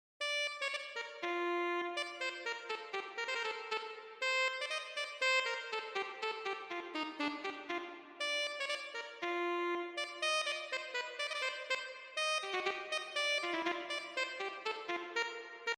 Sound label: SAX